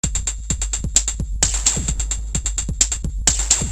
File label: loops